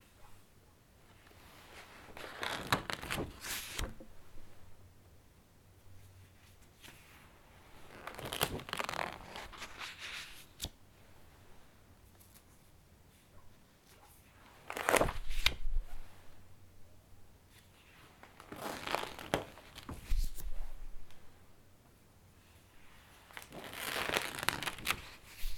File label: Paging Through A Book